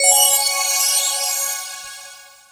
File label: magic